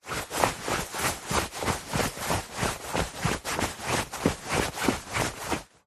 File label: foley clothing jeans denim pants trousers walking fast movements